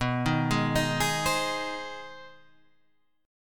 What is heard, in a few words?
G sharp Major B; arpeggiated; JGuitar